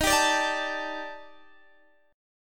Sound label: D sharp Major Sharp 11th D sharp Guitar Open D, chord; x,18,17,20,17,19; chordshape